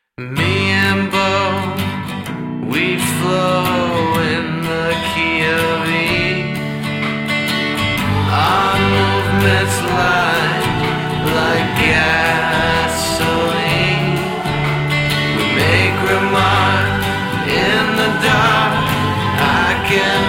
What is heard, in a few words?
commercial song 4 track style; ZIPPO lighters; commercials and jingles